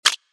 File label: gambling mouseclick; games; Fenix Play 27 Deluxe; Wazdan